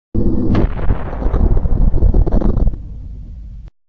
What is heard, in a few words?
E Charge Slo-mo